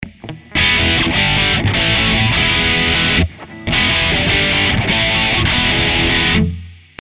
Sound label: American Lead